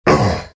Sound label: pain, vo; arc warden